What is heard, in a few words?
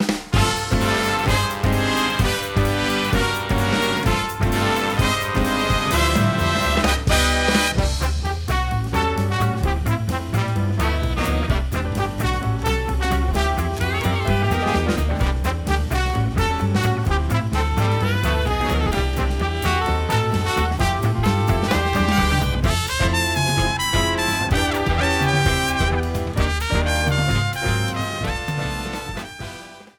Underwater BGM Arrangement; SMB3